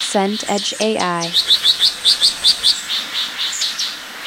bird sparrows close

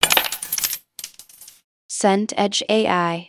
coins drop

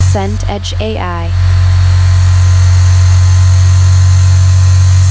compressor workshop

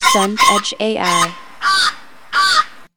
crow flock

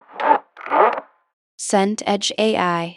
zipper pull

